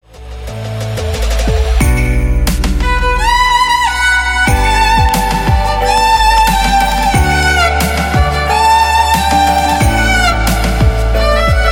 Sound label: Violinist